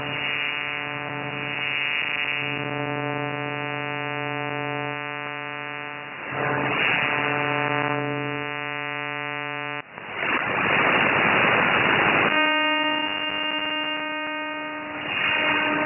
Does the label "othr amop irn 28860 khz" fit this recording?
Yes